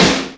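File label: SP-12, drum machine; Best Service Gigapack 2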